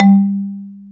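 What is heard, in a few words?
XYLO G 2, percussion; AKAI S-Series CD-ROM Sound Library VOL-8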